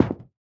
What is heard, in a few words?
mech armor land concrete; Factorio